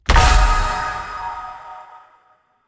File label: skill particulate boost